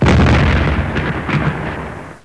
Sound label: bomb